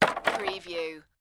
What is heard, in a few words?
Wood Drop On Wood